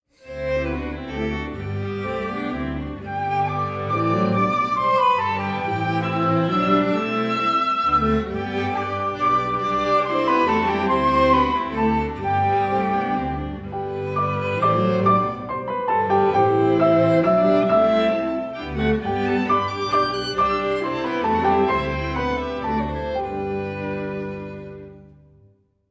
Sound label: Battements Tendus, exam music